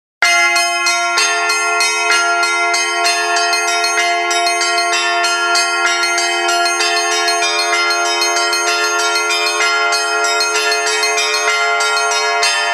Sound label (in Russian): zvon